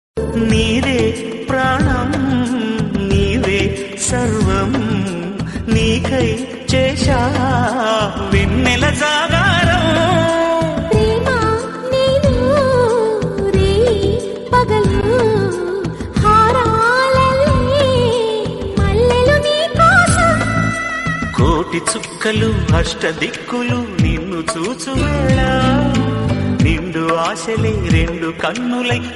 telugu song

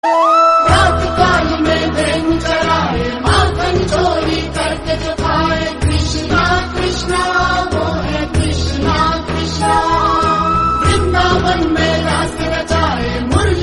flute music; krishna theme; mahabharat

